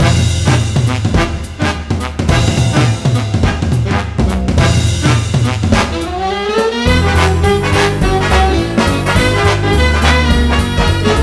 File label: music Droid Dance; Star Wars The Clone Wars Republic Heroes 2009 PS2 gamerip